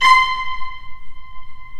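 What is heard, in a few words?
Viola C5; Digital Sound Factory Virtuoso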